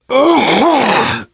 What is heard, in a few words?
cough, survivor voice biker